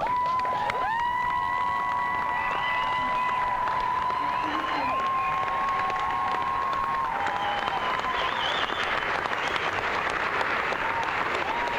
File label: crowd; adelaide, australia